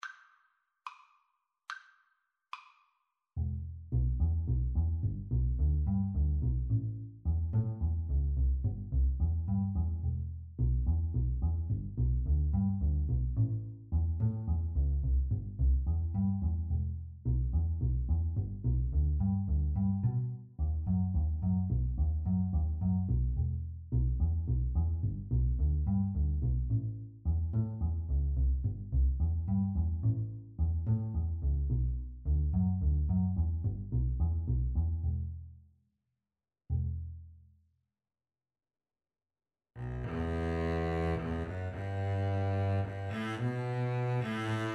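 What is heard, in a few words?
cello double bass, Cello-Bass Duet; house of the rising sun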